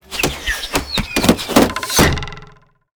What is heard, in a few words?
crossbow door